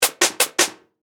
Multimedia Percussive, Technology, Multimedia, Percussive, Element